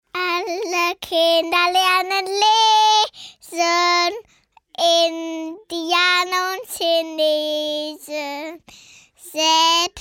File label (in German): Gesang